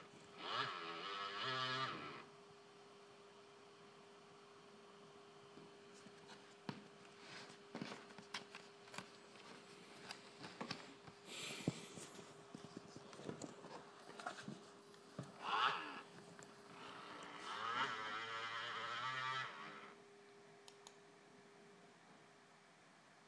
noisy noisy mbp fan

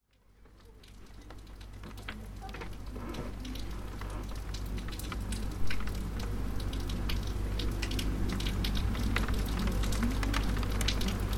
rain

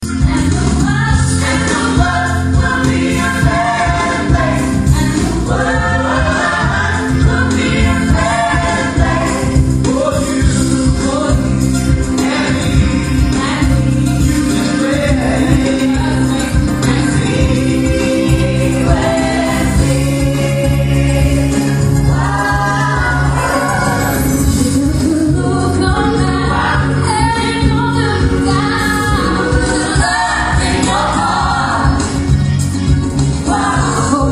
gospel choir

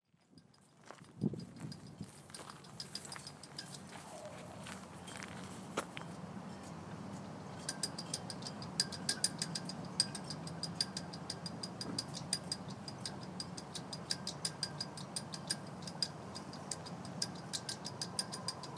wind in sails warsash

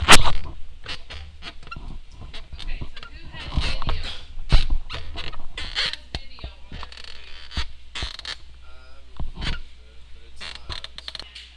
door opening

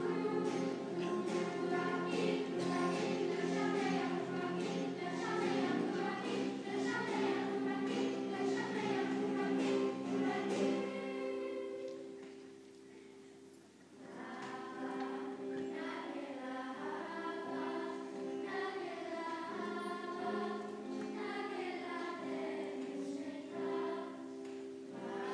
choir

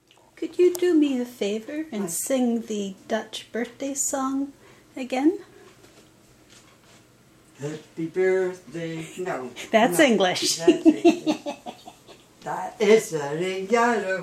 dutch birthday songs

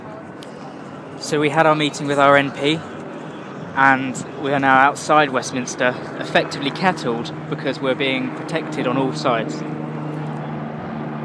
outside westminster

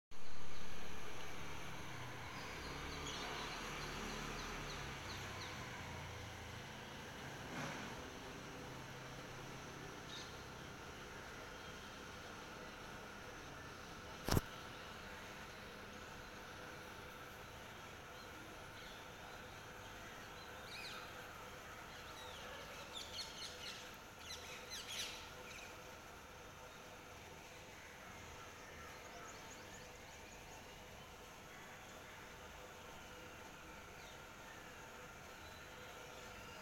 tropical birds India

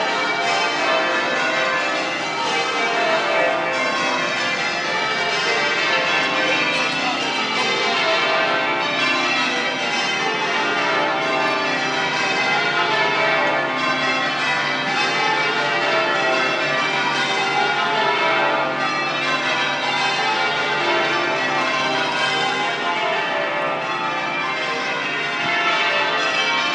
the sound of St Mary by bow

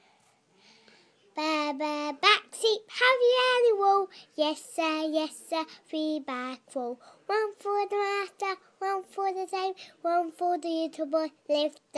baa baa black sheep

